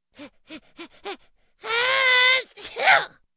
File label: CARTOON SNEEZE